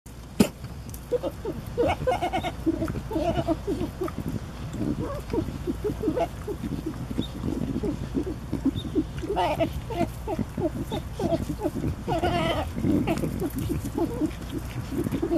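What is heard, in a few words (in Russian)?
звуки пекари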